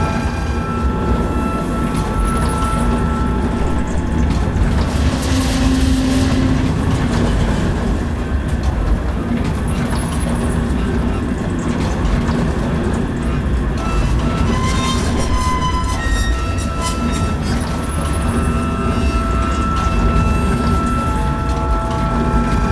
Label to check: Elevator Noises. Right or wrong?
Right